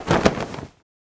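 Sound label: Damage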